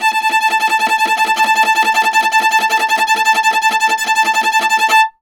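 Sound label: viola tremolo A4; Orchestral; DirectWave; Varazdin Orchestral; Viola II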